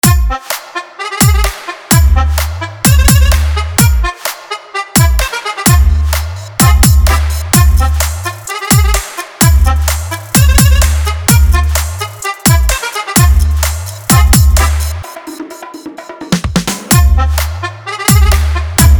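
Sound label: Competition Intro Dialogue Dj Remix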